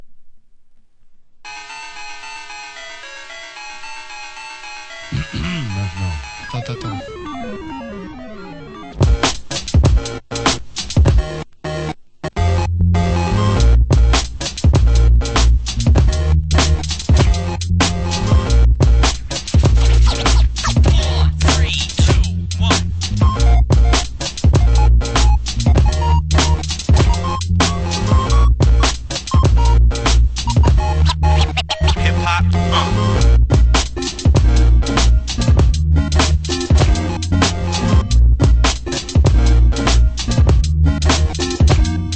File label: beat